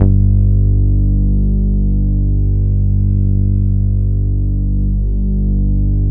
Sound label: BAS G1, 2 Step; USB Soundscan vol.51; House Side Of 2 Step; AKAI; BASS